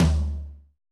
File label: tom, Roland MC-909